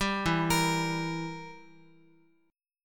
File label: E Diminished E, Guitar; Edim arpeggiated; JGuitar